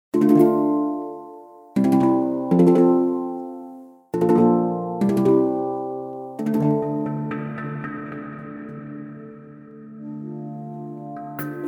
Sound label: pure real handpan